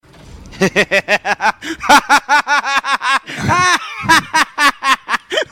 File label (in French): rire diabolique